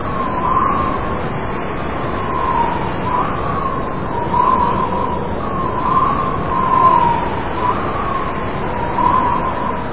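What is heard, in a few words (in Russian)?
ветер шумный долгий звонкий мелодичный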